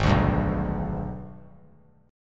G sharp Minor Major 7th Flat 5th; strummed; JGuitar

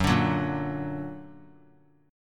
Gb Augmented; Gb+ strummed

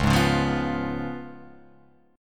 C sharp 7th C sharp, Guitar Open F; C#7; strummed; JGuitar

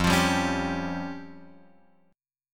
F Suspended 2nd Sharp 5th, chord; Fsus2#5 Chord {1 X 3 0 2 3} strummed

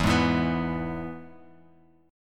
E-Major E, Guitar Open F; strummed; JGuitar

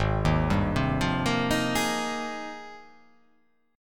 Ab Major 13th, AbM13 chord; arpeggiated; JGuitar

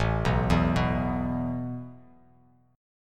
A Suspended 2nd down; arpeggiated; JGuitar

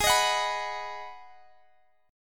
B Major G sharp; B/G#; strummed

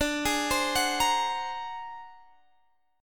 Ab 9th Flat 5th D

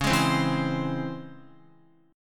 Db Minor Major 7th Db Guitar

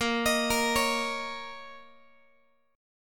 A sharp Minor Double Flat 5th, Guitar; arpeggiated; x,18,18,20,17,x; 4A,4E,5A,5D,5G#,6C#; JGuitar

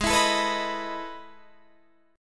E Major 11th chord

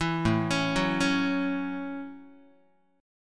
A 6th A Guitar, chord; arpeggiated

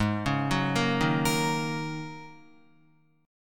G sharp 7th Suspended 2nd Suspended 4th, Guitar; G#7sus2sus4; arpeggiated

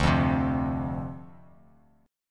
D-Minor 7th D, chord; Dm7; strummed

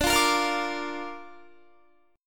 D Minor Sharp 5th D Guitar; Dm#5 strummed; 21,20,22,22,22,22

